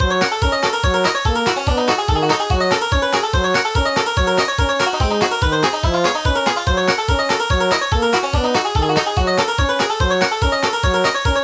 Bluegras

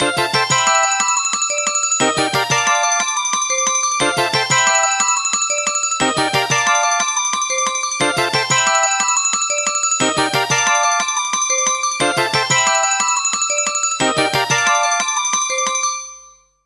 Alerter

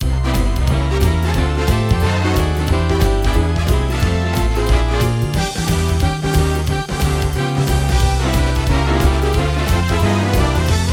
Bigband

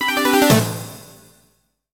Failure

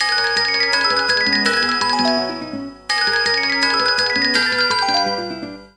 wind wave

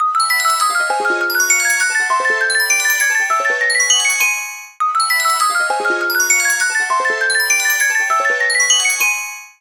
Echolog

